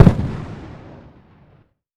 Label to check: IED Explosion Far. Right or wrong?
Right